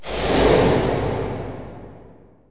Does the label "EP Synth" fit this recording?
No